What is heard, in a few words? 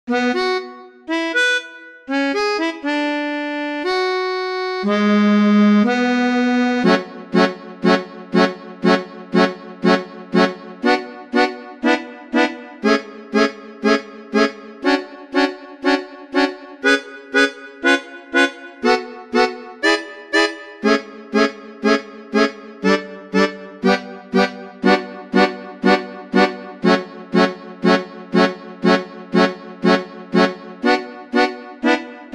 tango, melodica